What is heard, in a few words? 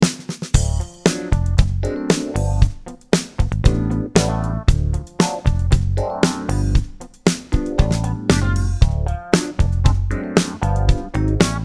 Backbeat Medium Pop